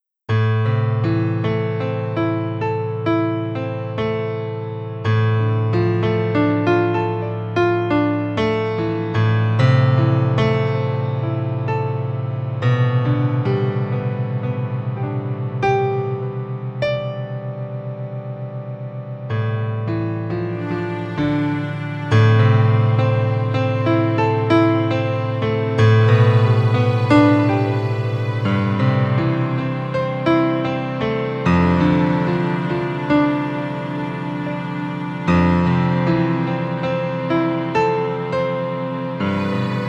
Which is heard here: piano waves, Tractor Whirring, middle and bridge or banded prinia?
piano waves